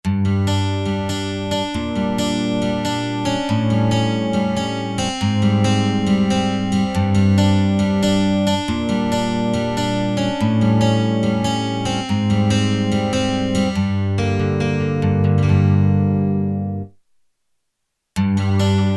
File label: Flanger; t.c. electronics M300